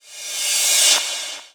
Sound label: Cymbals Reverse; Vengeance Samples